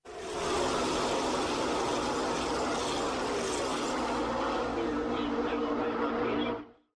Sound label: talladega races